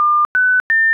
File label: alert; Intaleq Driver